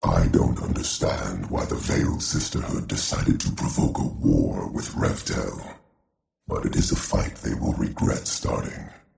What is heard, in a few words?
Brass herald; artifact; murder plot